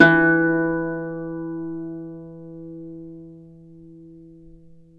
Celtic Harp, Plucked Strings

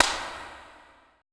E CLAP, ETH PERC

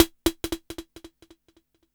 rim fx; USB Soundscan vol.19; AKAI; TIMBA

